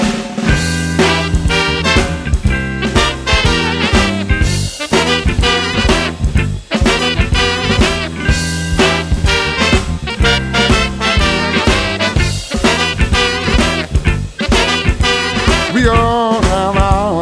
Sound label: funky